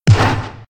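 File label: giant lynx damage up, monster; Senillinea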